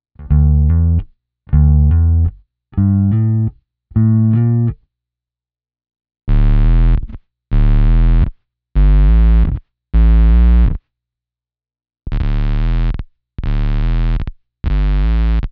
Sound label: boss oc2 normal vs squared, bass; BOSS OC-2 Synth Mod; Clip to compare the before/after into the KORG G5 and Source Audio Bass Envelope Filter Pro.; In this clip I recorded a simple loop